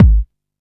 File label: Kick, Drum & Bass; AMG - Prototype Drum & Bass